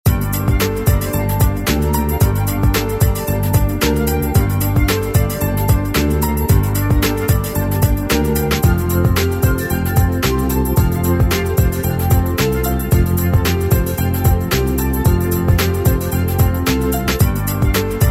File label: Corporate